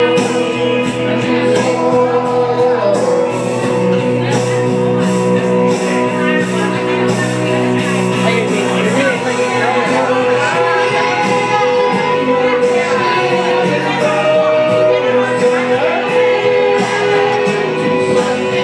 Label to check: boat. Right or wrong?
Wrong